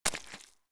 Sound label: FS Mud, footsteps